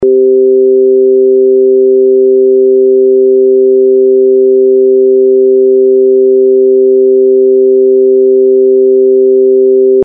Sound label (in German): sinus